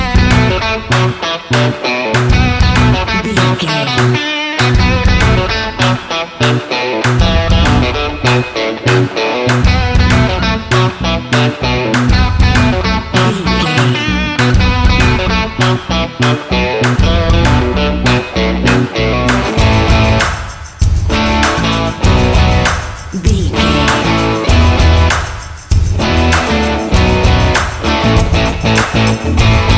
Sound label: rock machine loop